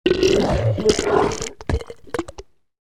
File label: Zombie Disgusting Sounds, Monster Sounds; TheDeclineOfWarriors; Monster Sounds Volume II